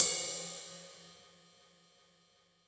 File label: RIDE, Yamaha DD-65 CYMB